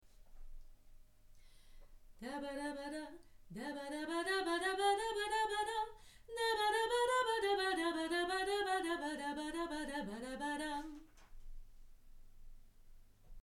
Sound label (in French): dabada lent chant